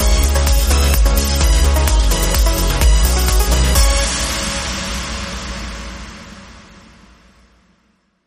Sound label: Europhoria Sting